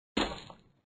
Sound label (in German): graben sound; The Dream Machine